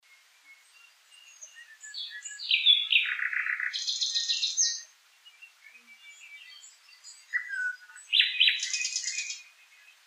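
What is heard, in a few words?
nightingale; solovei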